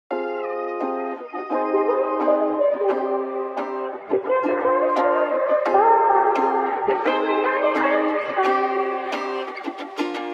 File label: hardstyle remix